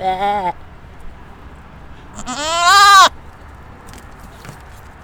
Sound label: Baaaa